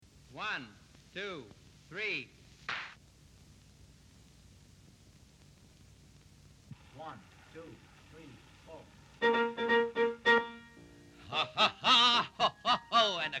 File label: Vocals